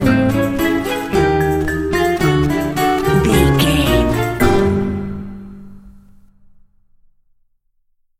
con man trickster stinger, silly, circus, goofy, comical; innocent; naive; child like; childish; Sitcom; Dramedy